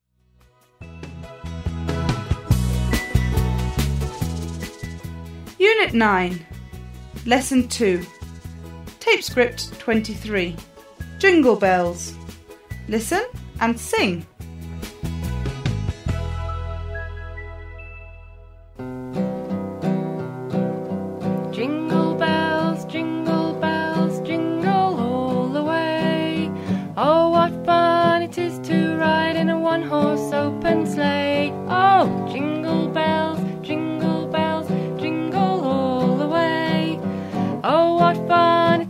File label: let's sing jingle bells; celebrations